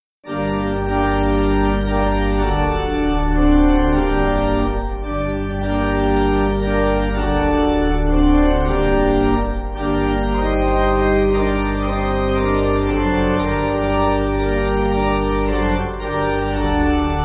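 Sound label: Pipe Organ, church backing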